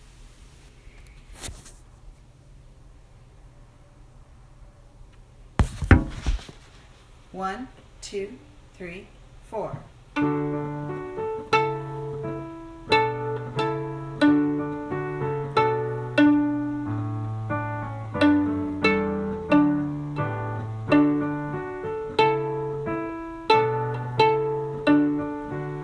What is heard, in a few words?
violin viola